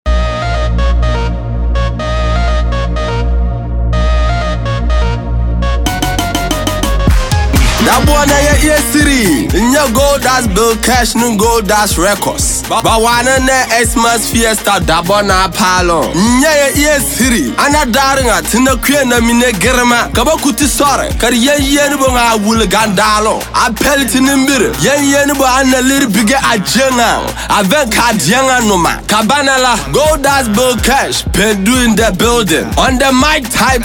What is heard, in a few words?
jingle